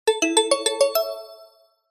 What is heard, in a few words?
multimedia alert notification mallet musical short positive success